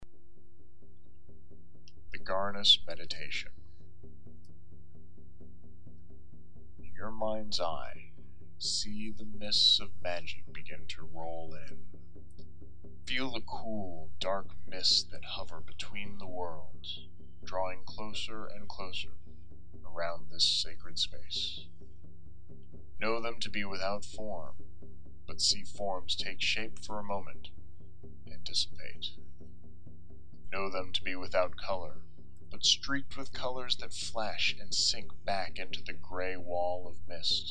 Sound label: meditation